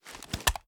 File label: reload xxmag fast end; gmod; iw8; uzulu